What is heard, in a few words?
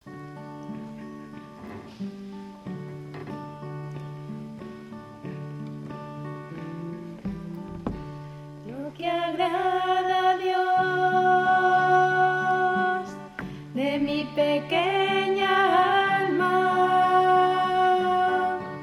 Sopranos